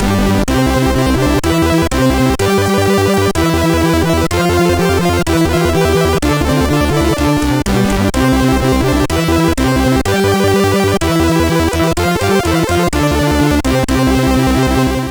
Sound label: crack intro, sid MOS6581R3; Genesis Project